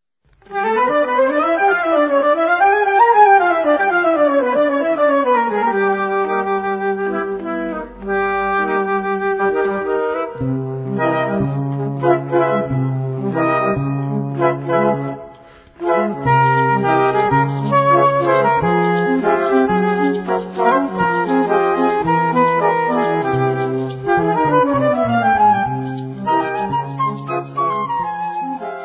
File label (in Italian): quintetto